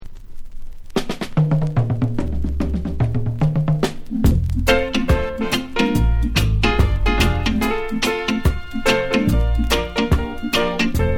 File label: REGGAE